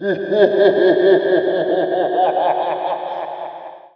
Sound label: Evil Lough